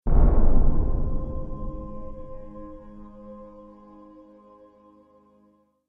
Angelic Drum Impact; Miscellaneous Impact Sound Effects

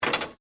METAL CRASH SMALL

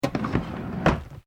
Drawer Plastic Cabinet Close Slow; Various domestic drawers; Household

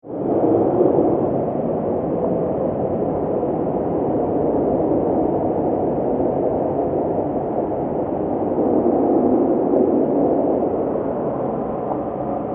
Wind Ghostly Gusts, Horror; Wind, Ghostly, Gusts, Horror, 5.1

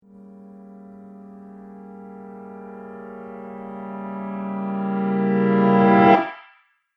Riser Reverse Piano; Rise And Dive Sound Effects

